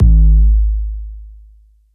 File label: Kick Trap Bass